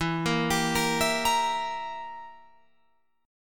E Diminished down Guitar Open F; Edim arpeggiated; JGuitar

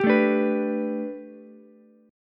A 9th Suspended 4th Ukulele C6, chord; A9sus4; strummed; JGuitar

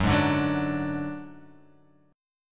Gb Suspended 2nd Sharp 5th, Chord; Gbsus2#5 strummed; x,9,6,7,6,9,x,x

